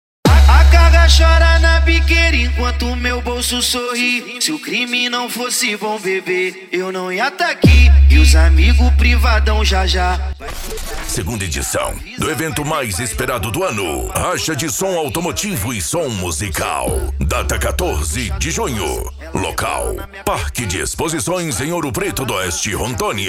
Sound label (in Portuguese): racha de som automotivo e som musical